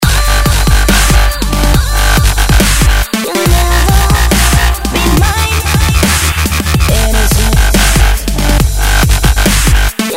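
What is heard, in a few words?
dubstep track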